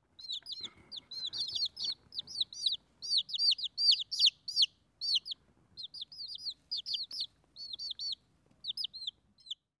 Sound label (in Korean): 병아리